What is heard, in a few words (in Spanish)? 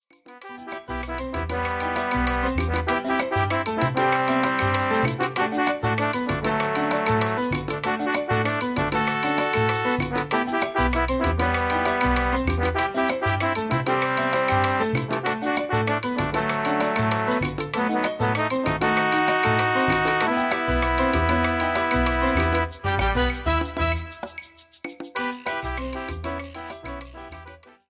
Salsa